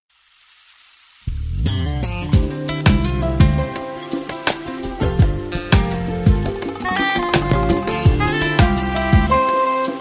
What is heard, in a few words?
Smooth Jazz